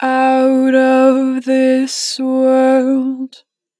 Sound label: Out of this world, 2 bar vib, Vocals, Natural Vibrato; Harmor Vocals